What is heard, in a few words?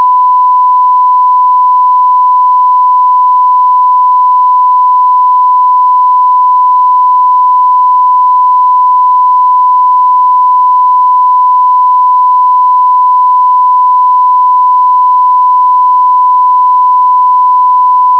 1khz